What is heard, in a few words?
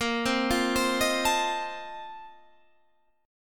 A sharp Major 7th Suspended 2nd Suspended 4th A sharp Guitar Standard; A#M7sus2sus4; arpeggiated; JGuitar